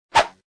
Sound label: bullet create; croods